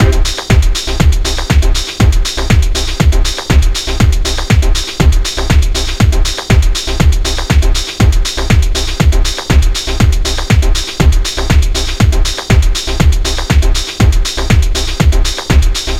120 Ravey

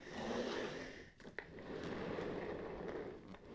Chair Rolling On Wooden Floor

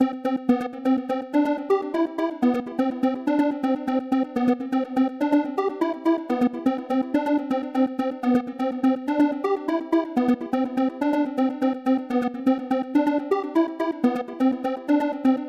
Trance Lead

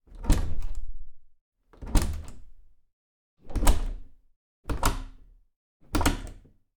Door Apartment Wood Int Open Close Medium Near

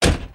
Car Door Close

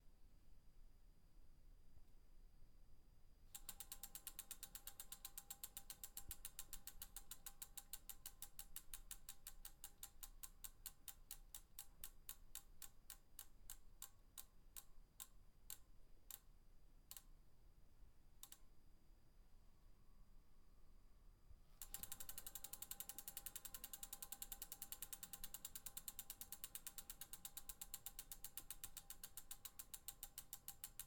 Spinning Bicycle Rear Wheel

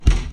Fist Hitting Wood Table

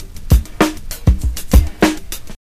99bpms

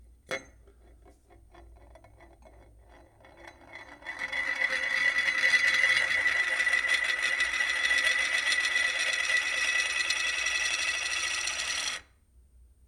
Tin Cap Rolling

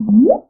Level Up

